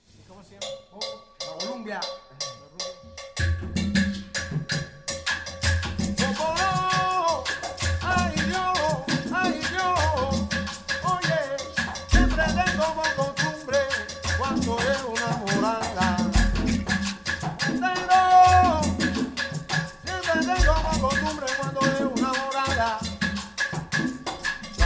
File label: Columbia, rumba